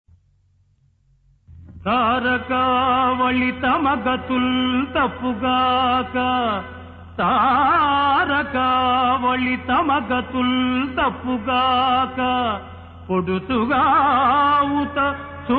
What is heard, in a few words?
Padyam; NalaDamayanti